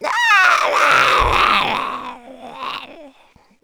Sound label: kid zombie idle; nmrih; childrenofthedead; zerosiki; slender